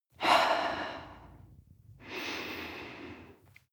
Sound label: breath female mouth out nose in